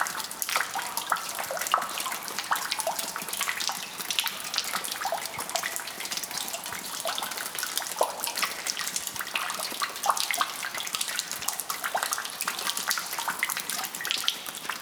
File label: Water Dripping; SFX; IRL Recorded